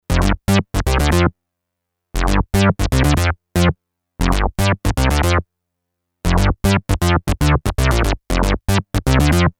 bass mooga, novation bass station rack; Bass: moog like

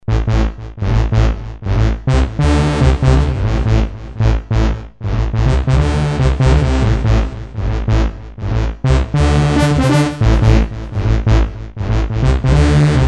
synth mooga, korg n364; synth: not a mooga; main CPU upd 70433 (V55PI) manages 64 voices polyphony based on Korg AI2

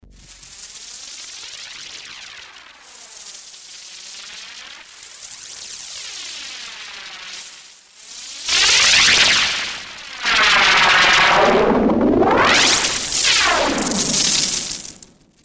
fx noise, Creamware minimax

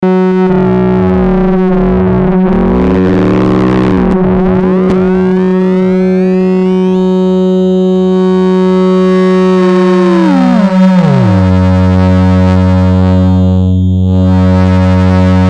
xp die, Moog Minitaur; Xperimental 2; analog monophonic synthesizer; 2 VCO oscillator

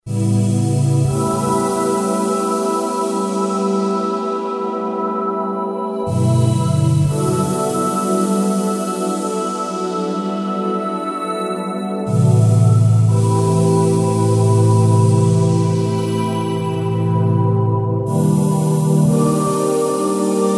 pad choired, synth roland jd800

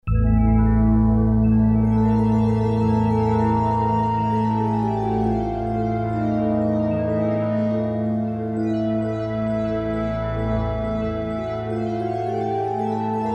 yamaha tg33; eerie

